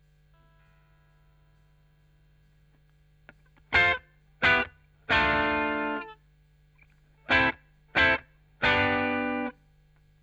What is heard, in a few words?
Chorus Gtr